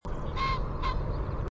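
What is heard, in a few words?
Crowing Call, Ring-necked Pheasant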